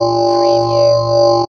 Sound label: Scanner Beam; sound effects bible